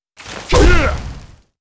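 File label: monster attack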